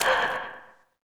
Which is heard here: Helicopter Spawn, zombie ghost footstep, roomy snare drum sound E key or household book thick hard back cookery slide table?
zombie ghost footstep